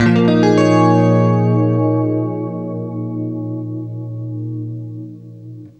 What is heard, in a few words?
CLEAN A#M9, Guitar; East West; Steve Stevens Guitar Samples Collection